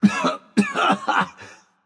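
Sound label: nonverbal clear throat; smite; voicepack; merlin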